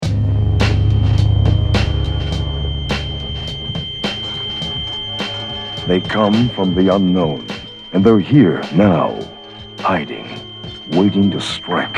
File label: rap inst